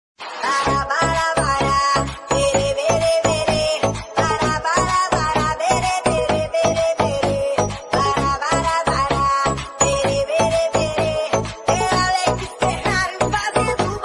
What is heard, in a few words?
Dj Remix